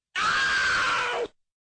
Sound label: scream; metal slug